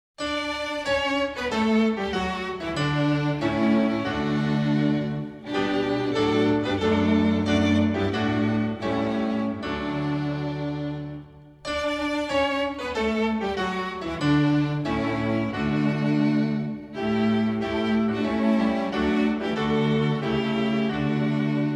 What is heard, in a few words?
instrumental, hymns